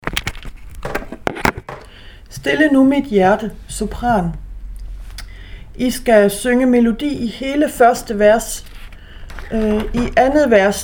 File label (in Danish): sopran